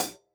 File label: hat hit, closed hat